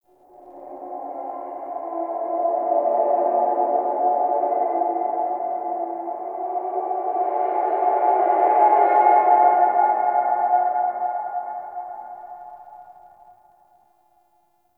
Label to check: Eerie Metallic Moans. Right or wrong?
Right